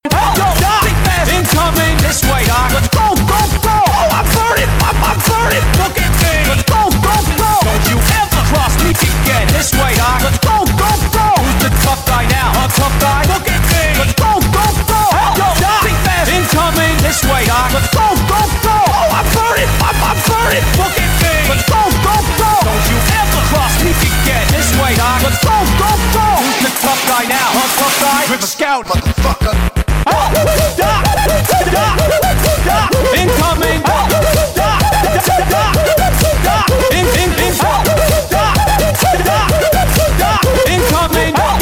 Drop, wub wub wub; TF2Maps

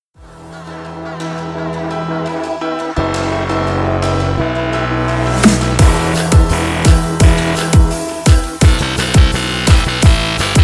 buildup; TF2Maps; chillskies; wubwubwub

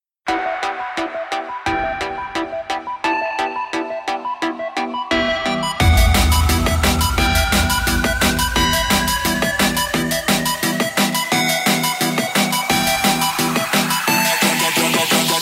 buildup; TF2Maps; chillskies; wubwubwub

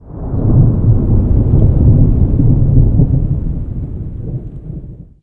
thunder; minecraft; ambient; weather

